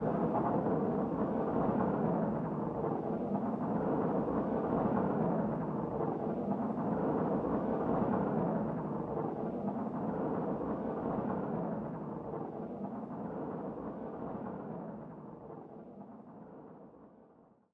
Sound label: Player Hand Slide Roll Fast